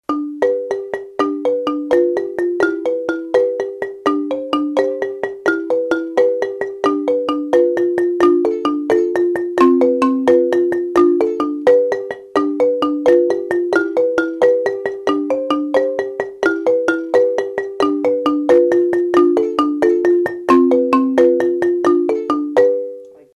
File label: together, marimba; kare mugomba